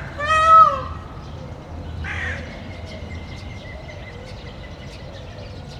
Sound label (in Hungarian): pávakiáltás, szürke varjú, zöldküllő; debreceni zoo 2012